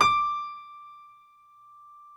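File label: D5 piano right; Northstar - New Gold VOL-2; BOS.GRAND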